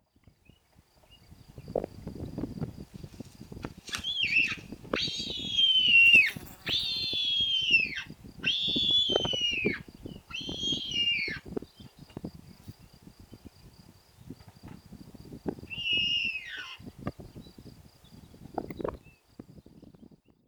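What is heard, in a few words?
Lybiidae, bird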